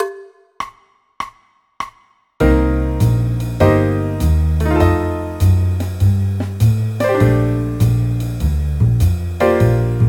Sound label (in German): bb dur akkordverbindungen